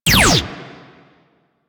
Bronco Prime Fire Shot 2D; Warframe; Weapons

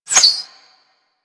Arrow Whizzby; Warframe; Weapons

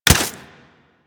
Staff Grab Idle B; Warframe

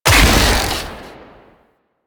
Secondary Barrel Fire, Weapons; Warframe; SU Modular Guns

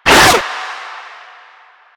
Proto Infested Runner Arm Attack; Warframe

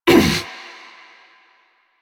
Stalker Pain Grunt; Warframe; Quests; Jade Shadows

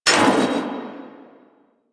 Spear Gun Mech; Weapons; Warframe; Tenno; Hoplite

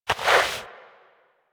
Crouch Move Foley; Warframe; Tenno

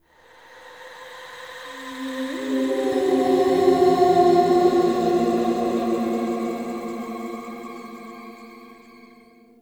Champ Crypt Spirit Lament; Ambience; One Offs; Champion Crypt

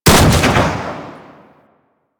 Sniper Rifle Shot; Warframe; Tenno

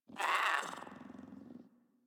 Catbrow Kitten Speak Playful; Warframe

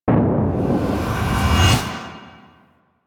Player Spotted Fail Buildup; Ballas; Warframe; Chimera Prologue

